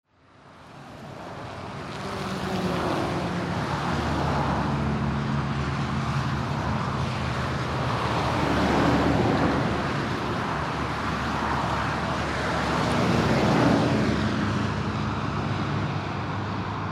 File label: HIGHWAY